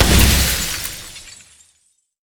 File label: gem knight shatter; LoL; taric